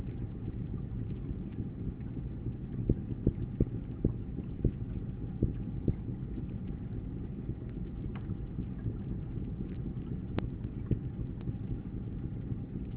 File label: Melanogrammus aeglefinus Knock Rumble